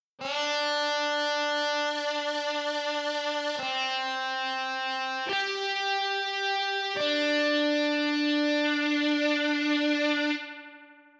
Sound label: Mod Wheel